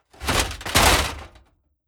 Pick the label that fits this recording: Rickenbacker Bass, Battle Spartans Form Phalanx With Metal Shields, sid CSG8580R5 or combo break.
Battle Spartans Form Phalanx With Metal Shields